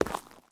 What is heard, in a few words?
zombie footstep concrete; DeadFright